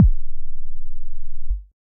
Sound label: Operator Kick, Percussive; Instruments; Ableton; Synth Essentials